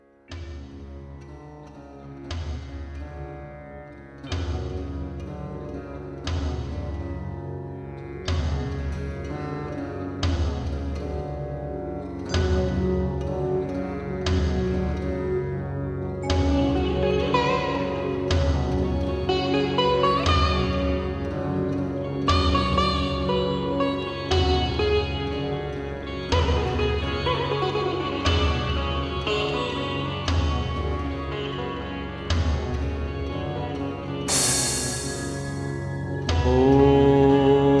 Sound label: chants